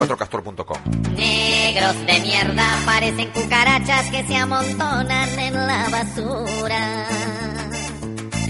cancion cucaracha

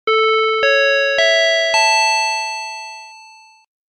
sonido supermercado inicio

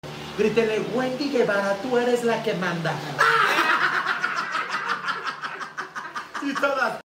risa

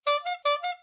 corneta

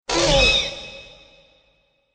grito llamada

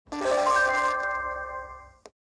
sonido impresion completa; hp

